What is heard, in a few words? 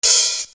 Hat, EuroDance